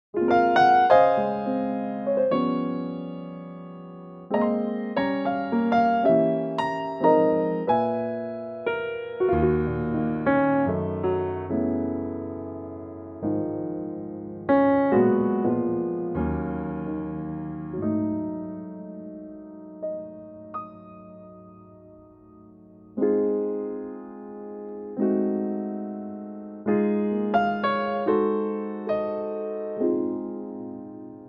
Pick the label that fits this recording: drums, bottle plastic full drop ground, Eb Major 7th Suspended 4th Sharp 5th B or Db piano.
Db piano